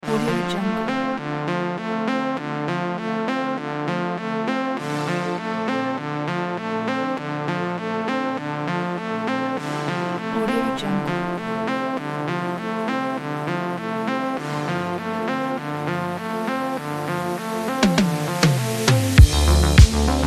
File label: 80s retro synthwave